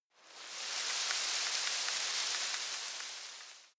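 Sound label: shrub little leaves rustle soft, garden; haus-garten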